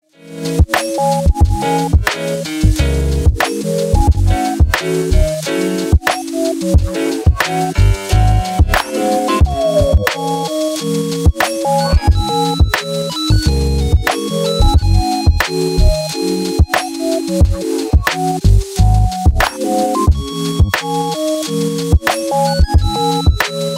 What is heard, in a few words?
Piano Joint